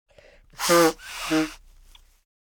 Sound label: Early forties male blowing nose